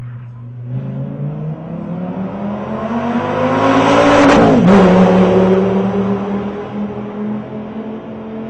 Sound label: Dodge Viper Flyby